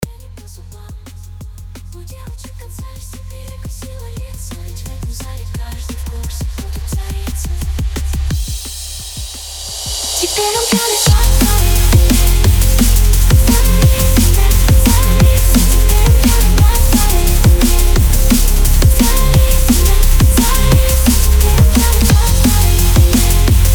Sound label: DNB